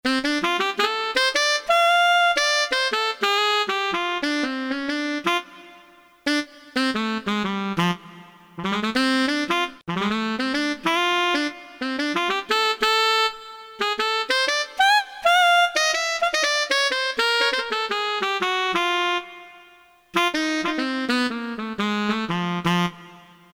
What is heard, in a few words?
A. SAX, Brass; KSDU-002 Brass